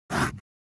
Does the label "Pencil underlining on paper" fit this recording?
Yes